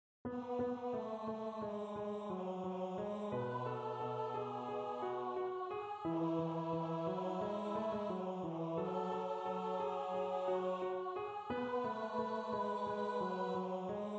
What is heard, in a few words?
two part mixed men lead